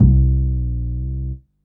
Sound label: Upright Synth Bass, Drum & Bass; Fluid Dynamics